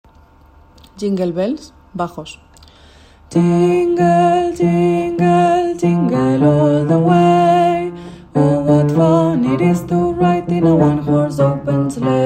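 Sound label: bass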